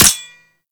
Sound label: Anti Aircraft Gun Mech; Weapons; Warframe; Archwing